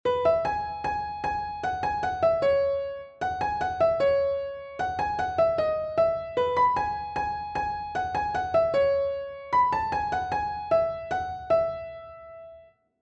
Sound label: melody